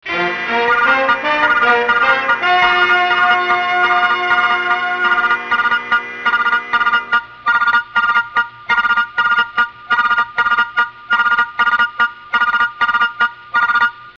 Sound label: Radio News sounder; ABC; 1960s